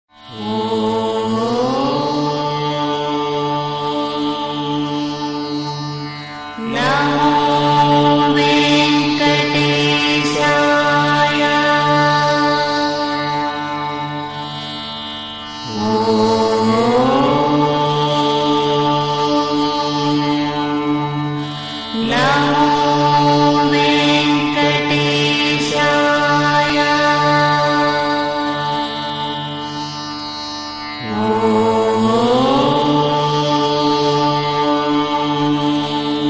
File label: om namo venkatesaya mantra; tirumala